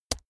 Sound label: keyboard laptop space push hard